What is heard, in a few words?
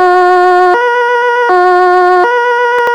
german ambulance siren; gmod; svmod